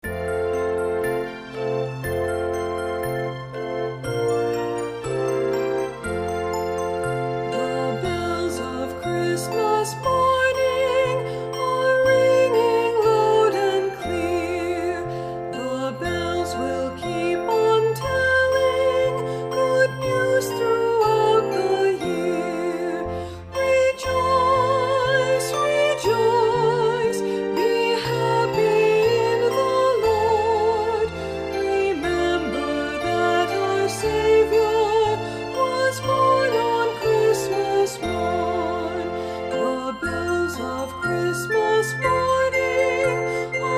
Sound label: Christmas Bells